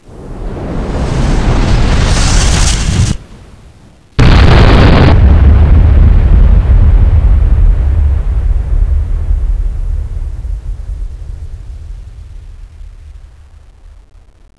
nuke blast, ambience; caged

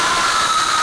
jumpscare

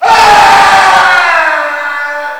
scream

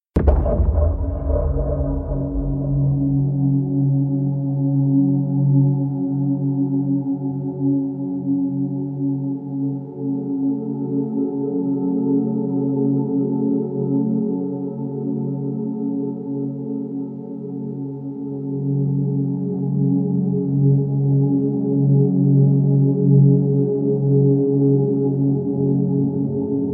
music inside submarine

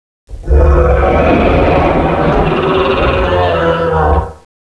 grab tube; tubequeen

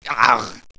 player pain

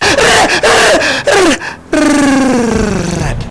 gatling

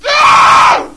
attack; desastre

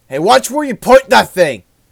shot

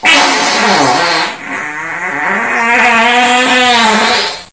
toot

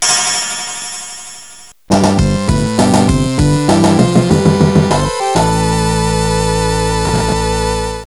end act; sven; sonic2

